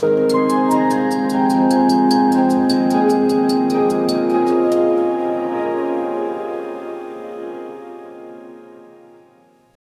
soft emotional tone gent; leaves rustling

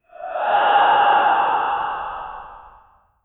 sudden terrifying human; cave

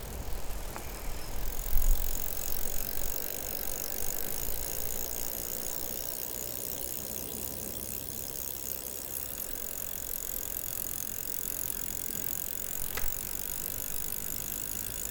a mountain bike is speedi

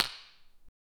the light click clack of

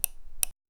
knob turning ticking sound

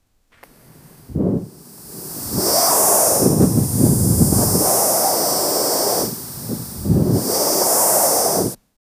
air wooshing

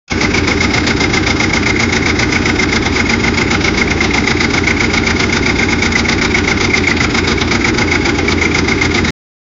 mahindra scorpio engine, revving

